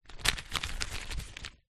transport drone propellers in pouch handling dji phantom 3